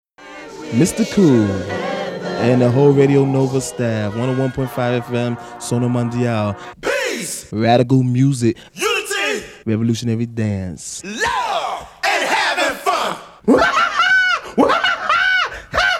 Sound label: Radio Nova Jingle; Nova 25 ans - 1986